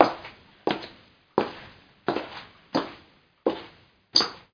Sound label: Foot Step